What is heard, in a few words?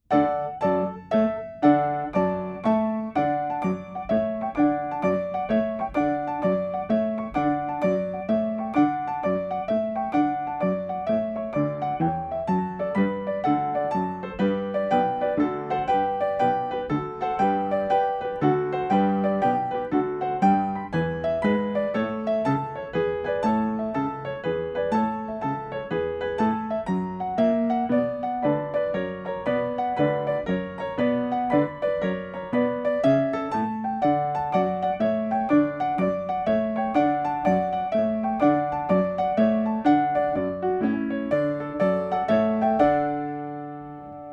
ACCOMPANIMENT; ZADOK NO. 2